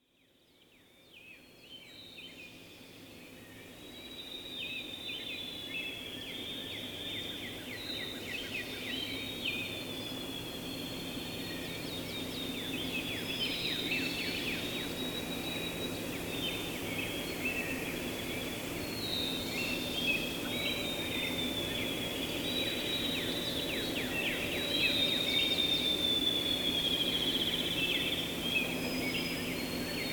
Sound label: dawn Chorus